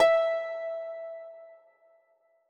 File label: E6 harp